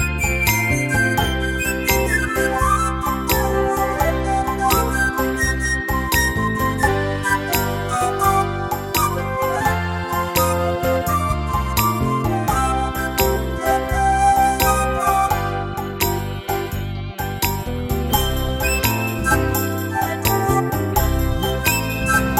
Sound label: Blues Ballad